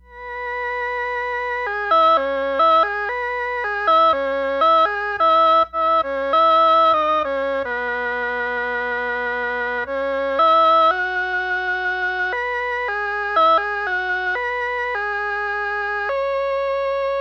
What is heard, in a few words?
Solovox Girl With Flaxen Hair